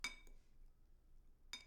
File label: Silverware On Plate